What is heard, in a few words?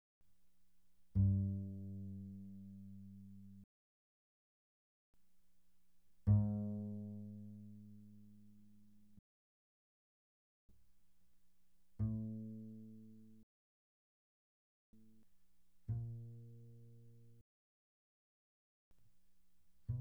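Bass pizzicato pianissimo sul G G2 B2, doublebass; Strings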